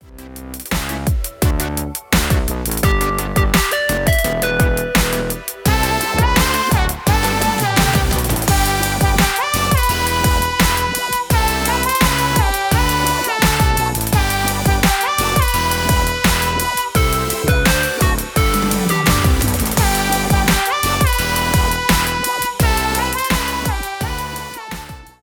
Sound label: future bass beat; future bass beat 2